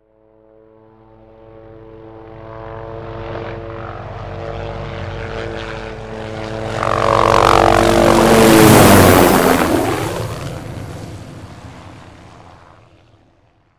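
approaching rising sound of a helicopter flying low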